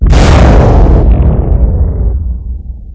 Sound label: shock fire; pod roc